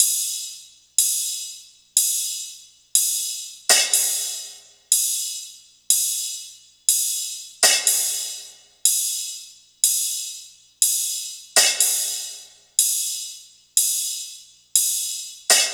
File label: hat 122, Drum Loops, Hat Loops; SD MIDNIGHT Sample Pack; Slate